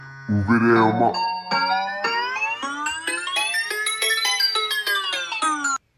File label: ringtone slowed